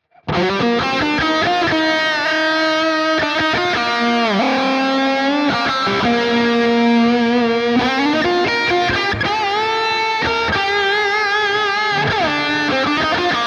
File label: Crystals Lead; Archetype Petrucci